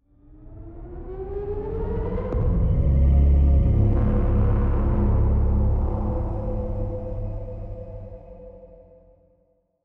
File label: ship battleship move; Stellaris; shroud; ships